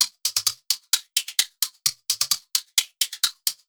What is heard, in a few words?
130 Dance Perc Fx; Percussion; Pro Tools Essential Loops; Digidesign